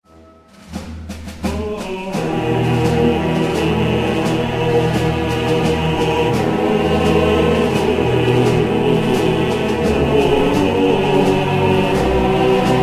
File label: defeat crow